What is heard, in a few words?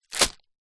office document folder wallet plastic set down or drop